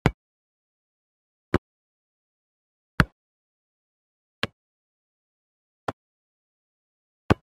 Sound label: Football ball kick